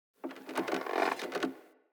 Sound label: Tent Rope Creak, Interior; Soul Plane